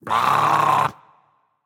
tongue; smite; ratatoskr

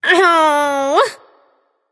ouch; smite; artemis

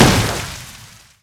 impact; Smite; Vulcan

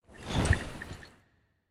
armor; Smite; god Khepri

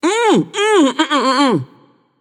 notice; babayaga; smite

